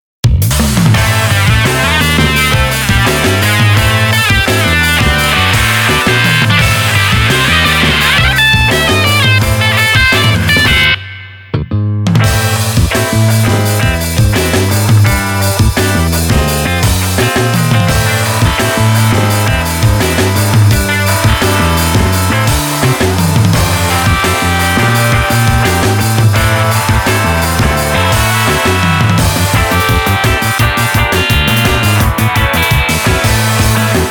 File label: bomb boy inst